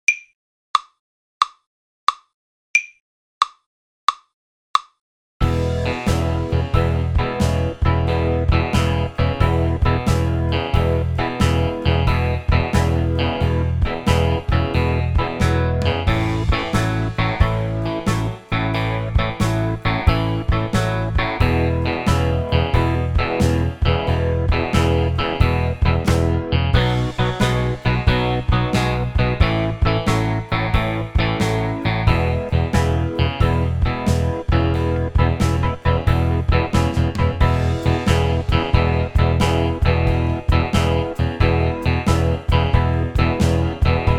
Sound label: 12 bar Blues E